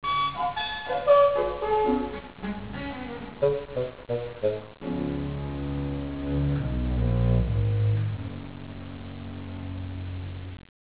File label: victory